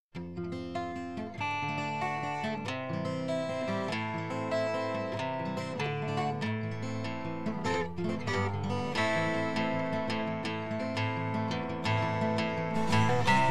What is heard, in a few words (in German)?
mein haus instrumental